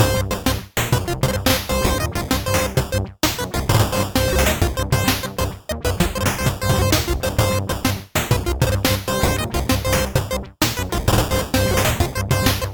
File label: Gaming Arcade Loop